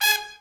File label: SECT BRASS FX